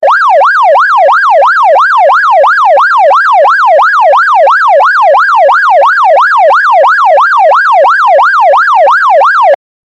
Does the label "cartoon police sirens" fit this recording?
Yes